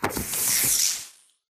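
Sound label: impact bullet energy titan shield arc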